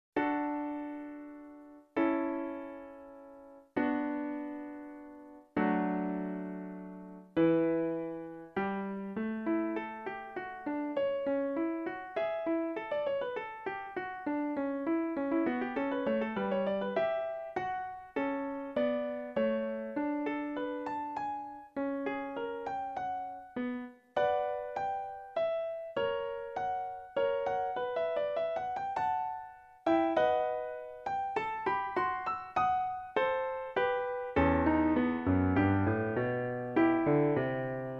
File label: oboe sonata; saint-saens